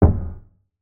empty plastic barrel hit

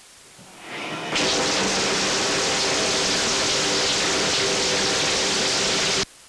shower running

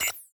error buzz

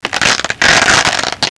VELCRO; ripping

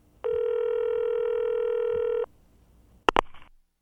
phone call no naswer

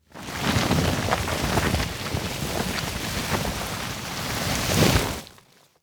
heavy drag

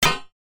metal pipe hit

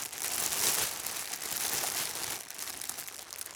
plastic wrap; band-aid

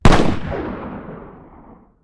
magnum exterior shot

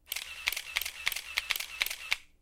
shutter repeat camera

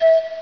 sing glass

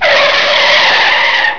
car braking

